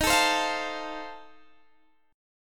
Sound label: F 7th D sharp